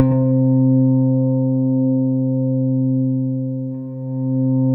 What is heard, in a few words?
C3, SynthLine